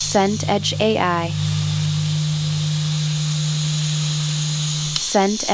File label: pressure cooker hiss